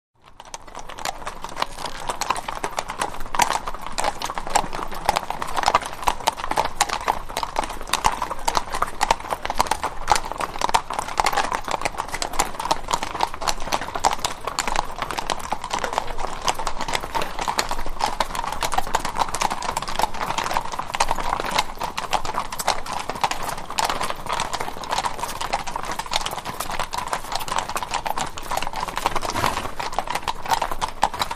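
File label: Cavalry Walk Constant, group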